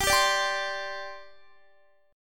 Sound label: G Suspended 4th, Guitar; Gsus4 strummed